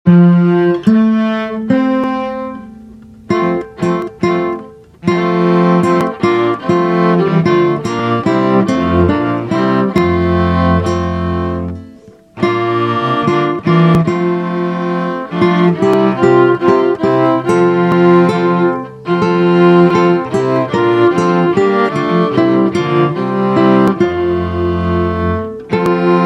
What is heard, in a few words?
guitar; Quando ritrovo